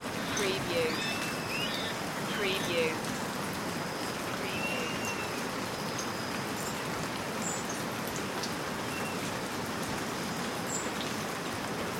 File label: nature rain